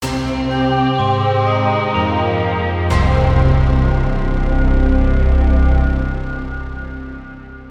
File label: Short Electronic Jingle 125 Bpm